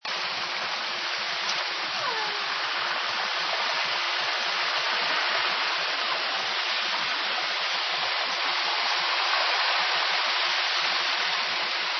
transient calls, orca

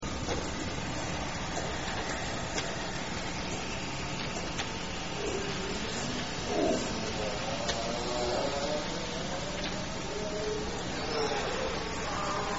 megayawn, transient killer whales; salish sea

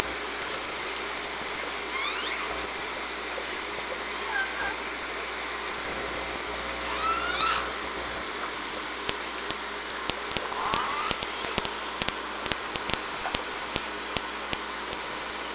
Clicks Calls

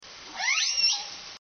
S2iii, orca call

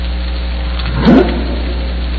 vroop, humpbacks; salish sea data examples; signals

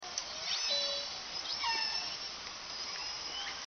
sequence, orca

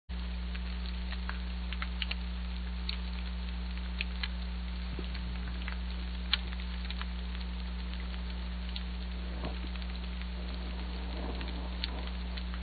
harbor seal

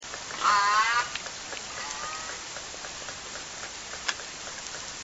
J S4 rising med, orca call

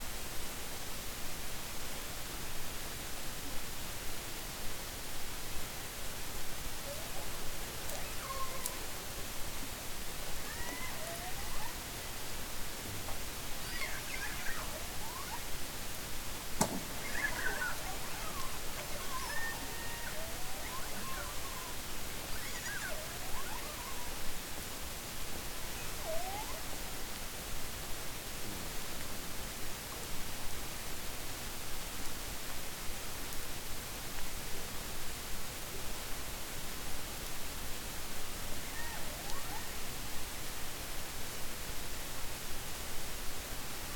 Biggs, biophony

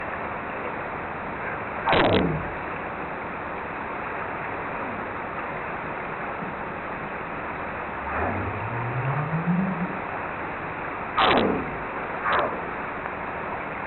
n pacific right whale; salish sea data examples; not yet heard locally but present

